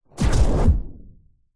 science fiction weapon incoming bullets hit impact whoosh; zapsplat